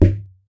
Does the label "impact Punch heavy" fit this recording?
Yes